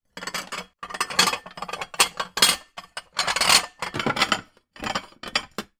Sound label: household crockery plates bowls rummage drawer; zapsplat